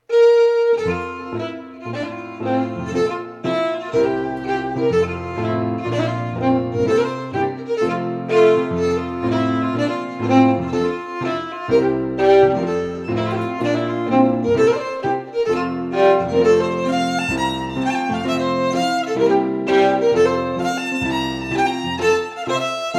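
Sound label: Reel, Scottish Fiddling; Macglashan col 1796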